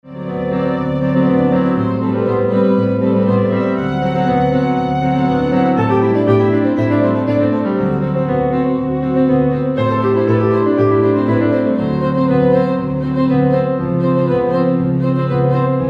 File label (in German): Klaviertrio